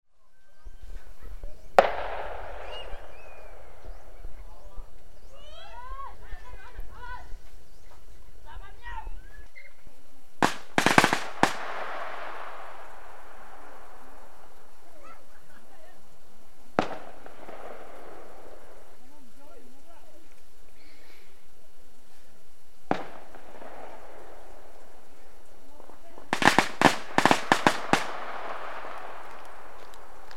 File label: firecrackers